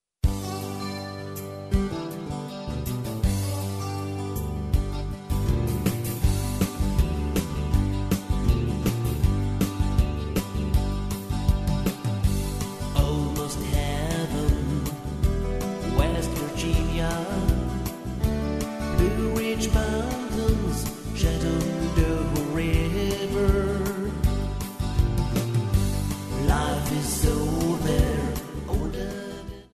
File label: country